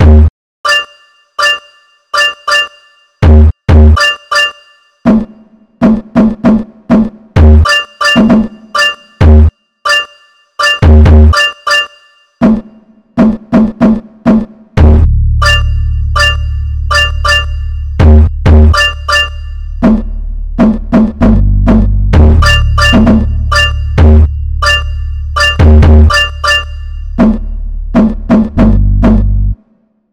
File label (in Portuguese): BEAT PIANO ESTILO BH MODINHA COM E SEM GRAVE